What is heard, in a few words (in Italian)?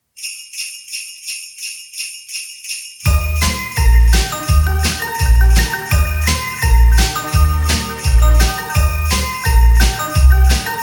JINGLE BELLS CAMPANELLI